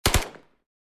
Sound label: AK 47 double shots